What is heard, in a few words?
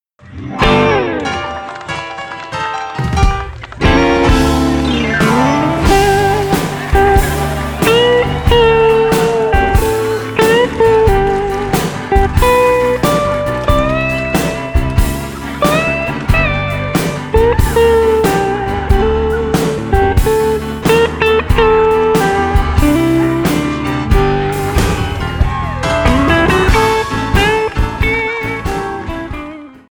auld lang syne